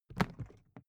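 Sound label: briefcase plastic pick up hard, Büroarbeit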